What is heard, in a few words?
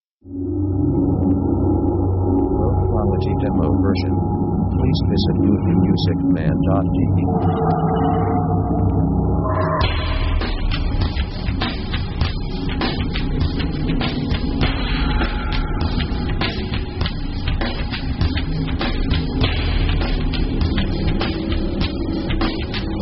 action